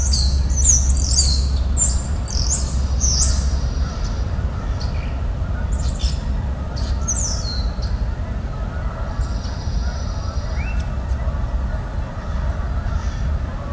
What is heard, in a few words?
Ruckus, birdcall